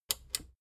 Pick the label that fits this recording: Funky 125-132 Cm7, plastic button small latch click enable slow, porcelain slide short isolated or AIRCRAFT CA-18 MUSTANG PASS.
plastic button small latch click enable slow